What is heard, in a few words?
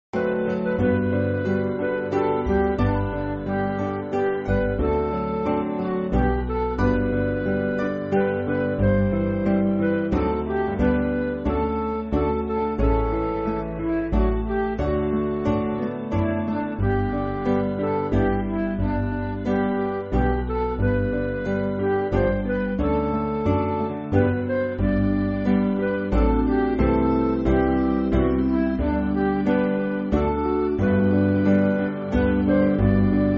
Midi; All The Way; Lowry